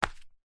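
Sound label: foley sandal flip flop single hard footstep on hard ground; zapsplat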